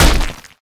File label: hit